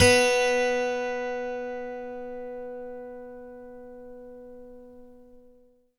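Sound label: GTR B2 12; Synclavier Sampler Library; KEYS AND GUITARS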